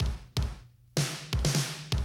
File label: 125, Classic Drum Loops